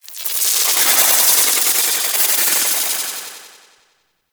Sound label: Kubrow Death Dissolve